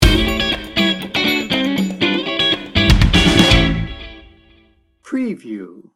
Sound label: funky and fun sting bumper